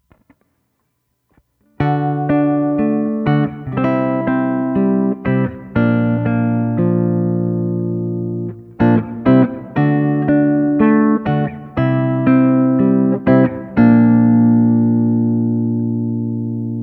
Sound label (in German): Unterschied Dur und Moll